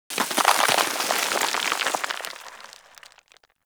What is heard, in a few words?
Generic Explosion Rocks Large; Warframe; Explosions; Surface Hit Effects